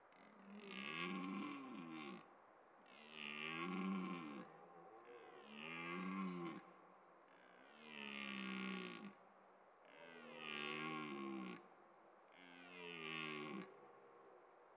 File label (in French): Brame du cerf en Cantal